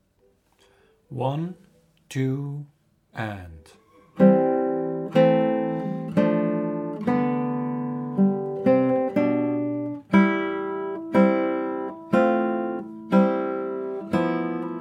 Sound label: 60bpm, guitar